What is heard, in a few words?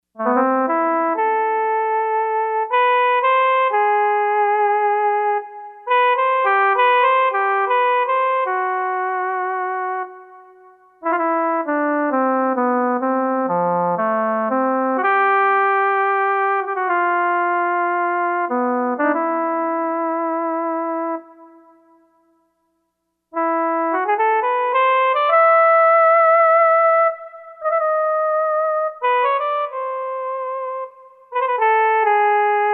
Oboe, Korg MSC-4S Orchestra; Orchestra 1